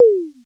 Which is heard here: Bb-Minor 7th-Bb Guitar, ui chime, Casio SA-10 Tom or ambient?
Casio SA-10 Tom